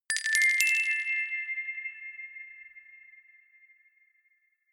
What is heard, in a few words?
notification glockenspiel rattle triad up minor